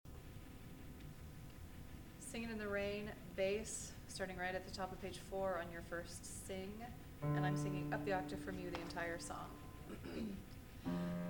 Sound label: bass